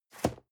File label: cardboard box medium place slide; objekte